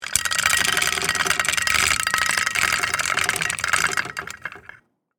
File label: Chain Pulley Pull, Industry, Chain, Pulley, Pull; Fast, Long; Factories; BlastWave